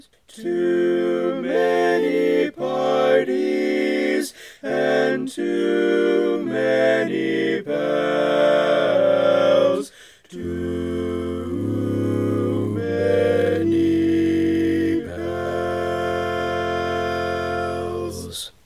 Lead, barbershop

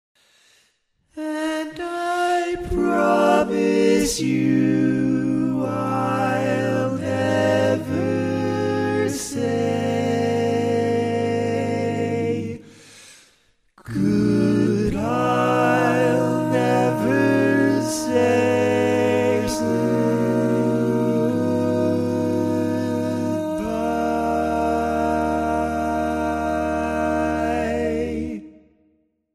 Lead, barbershop tags

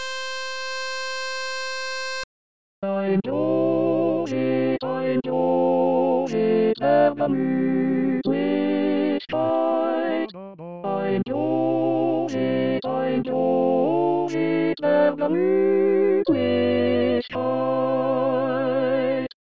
Lead, barbershop

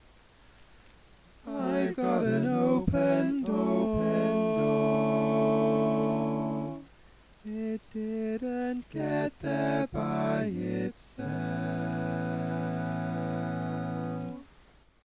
Open Door, All Parts, Barbershop

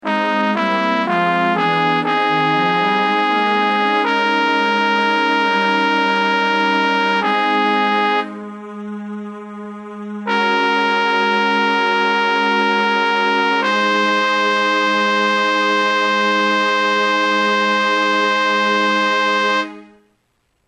Tenor, barbershop

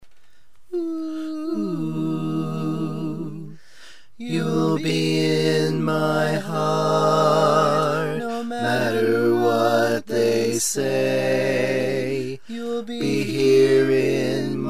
Bass, barbershop

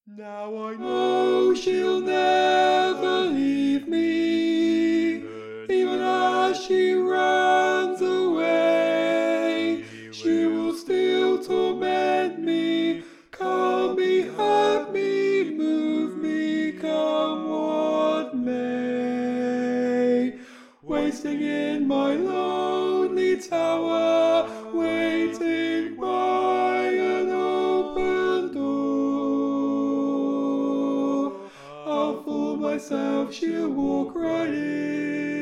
tenor

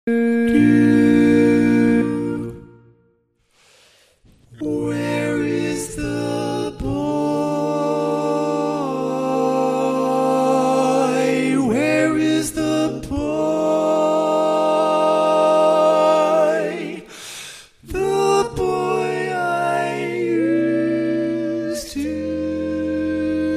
Lead

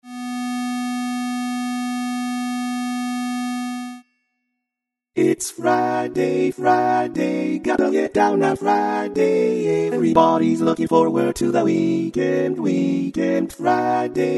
Tenor, barbershop tag

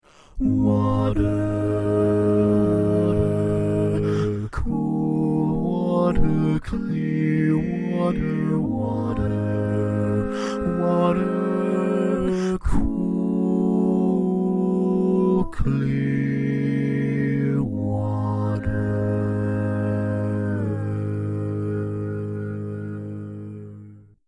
Bass, barbershop tags

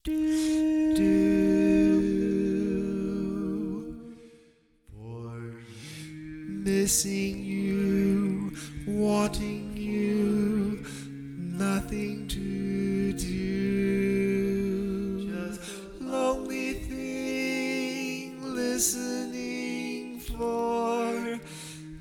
Bari, barbershop tags

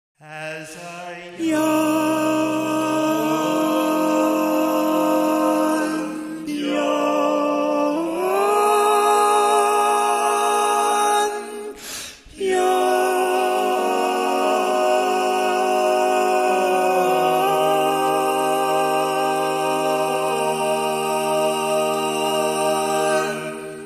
tenor

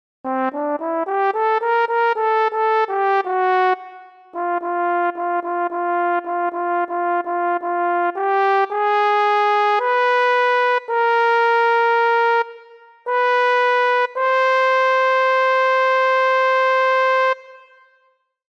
Tenor, Barbershop

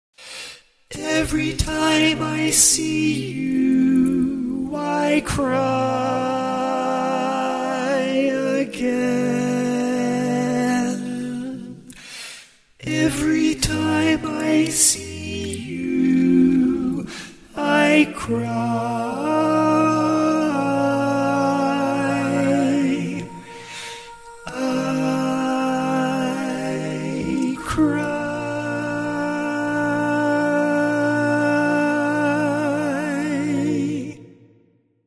Lead, barbershop tags

